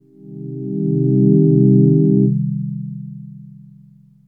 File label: voice, dx7